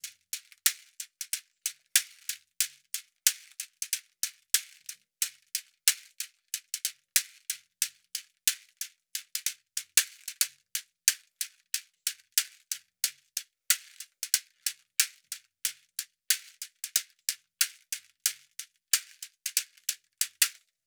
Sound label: shaker 092; 092bpm; Hip Hop India Modern Percussion